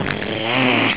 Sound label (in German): fauchen